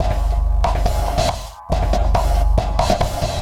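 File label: Saver Loop, beats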